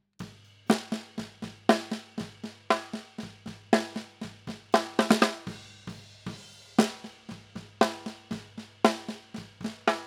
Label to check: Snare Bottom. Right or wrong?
Right